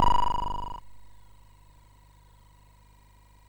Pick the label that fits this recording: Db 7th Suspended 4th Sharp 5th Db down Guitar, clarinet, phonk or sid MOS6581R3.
sid MOS6581R3